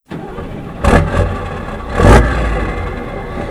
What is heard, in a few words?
turn on, vehicles truck; tdmcars